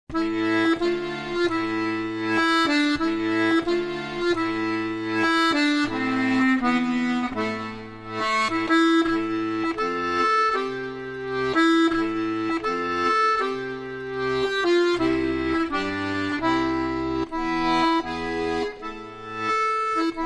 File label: Roots Accordion